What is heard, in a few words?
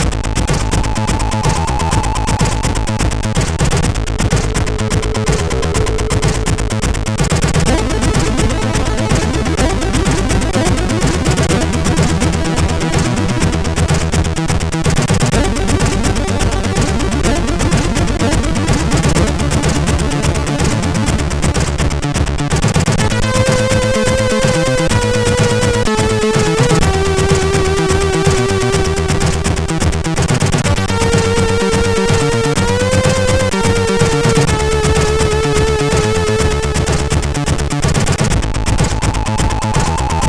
Metal Warrior 2, CSG8580R5